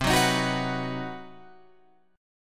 C Minor Major 7th Flat 5th chord, Guitar Open F; strummed; JGuitar

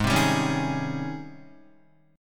G sharp Major 11th G sharp, Guitar; 4,3,5,6,3,3; JGuitar

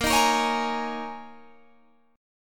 D sharp 7th Suspended 4th A sharp, Guitar

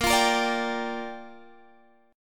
G Minor 7th, Bb

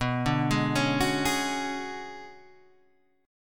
B Minor 6th Add 9th B down Guitar Open G

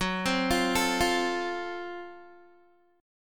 Gb Minor Major 7th Flat 5th, Guitar, chord; arpeggiated

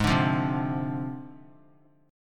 Ab 6th Flat 5th Ab; strummed; JGuitar

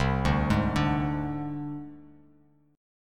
C Suspended 2nd Sharp 5th, down, Bass Standard; Csus2#5 arpeggiated; JGuitar

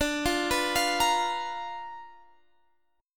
B Minor Major 7th Flat 5th D, chord

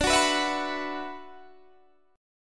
D 6th Add 9th D, Guitar, Standard; D6add9 strummed; JGuitar

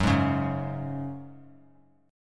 Gb Suspended 2nd, 3C,3F,3A,3D; Gbsus2 strummed

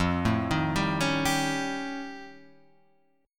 F Augmented 9th, Guitar, Drop D; 3,0,1,0,2,3

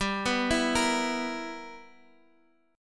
B Major 7th Suspended 4th Sharp 5th G

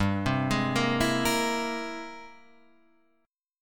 G sharp Augmented Major 9th G, guitar

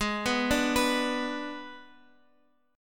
G sharp Diminished G sharp, Guitar Open F; G#dim arpeggiated; JGuitar

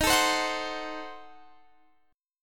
D sharp Diminished 7th D sharp Guitar Standard; strummed; D#dim7; x,18,19,17,19,20; JGuitar

